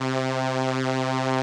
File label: SOLINA, String Machines